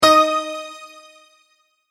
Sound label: multimedia tone correct; Tones (Sound Effects:Sound Design); McKinney Sound